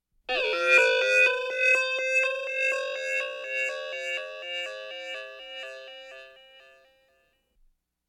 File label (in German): torpedo, Science Fiction